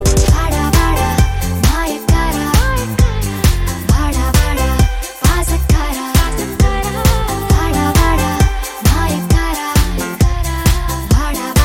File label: BGM Ringtone; Mughizh